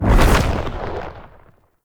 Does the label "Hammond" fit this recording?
No